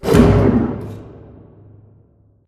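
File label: Assembly Conveyor Stop Distant; Boomtown; Titanfall 2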